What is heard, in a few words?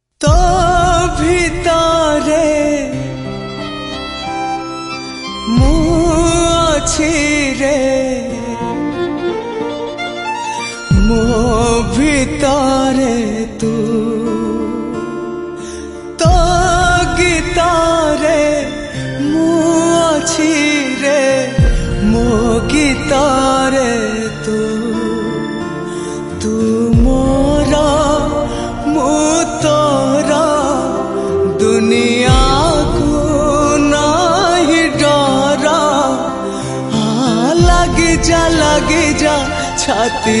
Sad Male